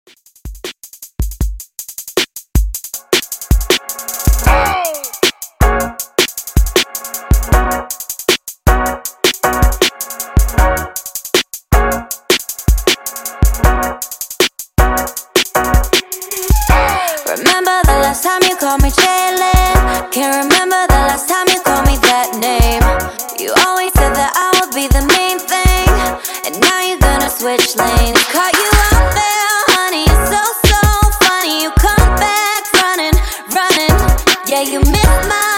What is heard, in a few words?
Dj In Outro Redrum 157Bpm